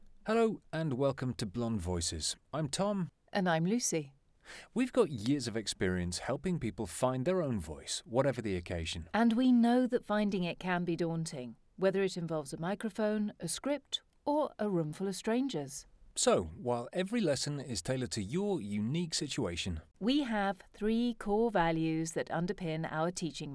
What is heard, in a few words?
Intro music; Blond Voices